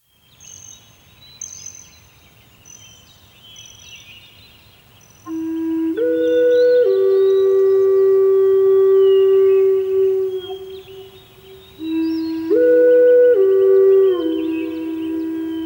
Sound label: native american flute